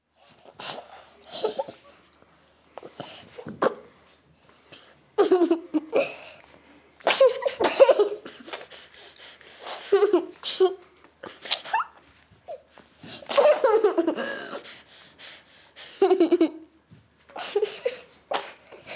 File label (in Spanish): NINOS sonriendo INTERIOR